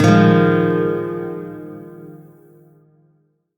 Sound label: Shawzin Min Chord F; Warframe; Lotus Shawzin